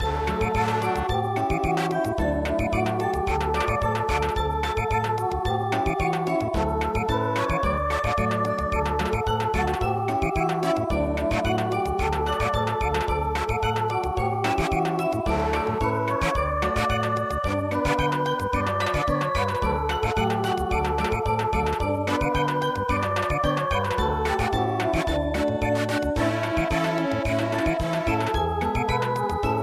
cheerful harvest Samba

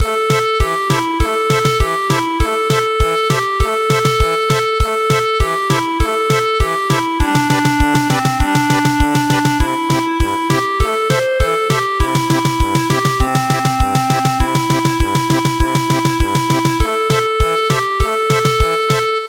German Polka, traditional; The Rainbow Sister

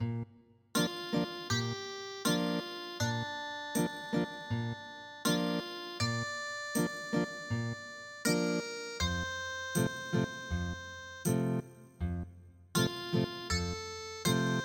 Ethnic Guitar, traditional; EVENING CHIMES

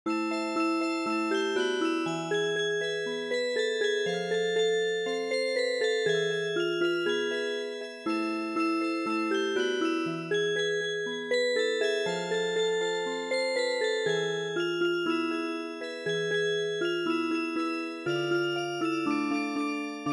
Music Box, midi; Hava Nagilah

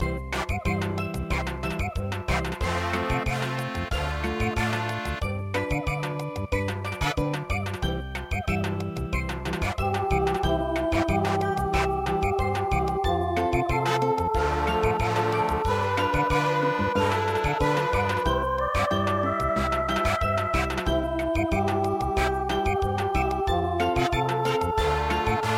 Samba

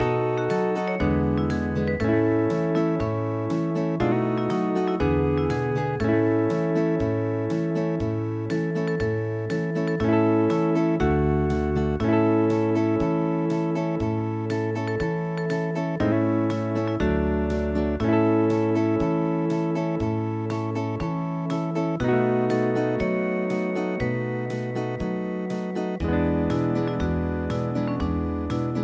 hawaiian

